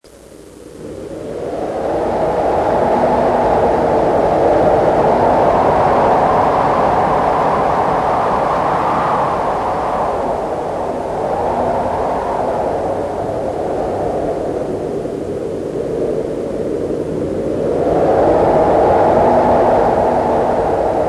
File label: Wind